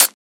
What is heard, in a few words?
Closed Hat